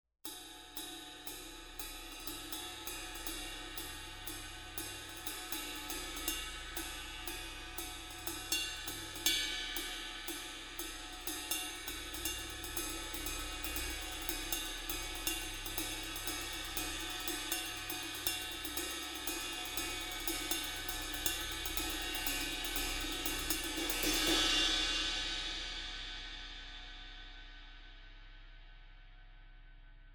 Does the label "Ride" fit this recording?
Yes